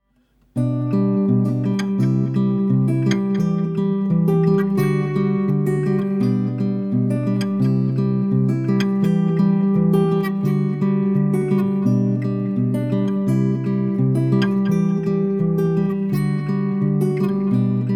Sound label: FX ON Acoustic Guitar; DelaySon